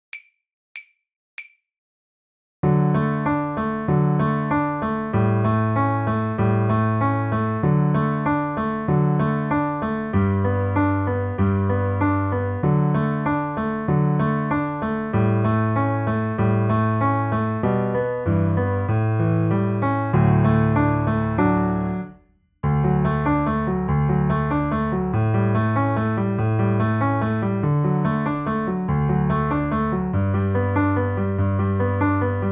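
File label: Auld Lang Syne accomp, violin; The Violin Fun Book (Level 2)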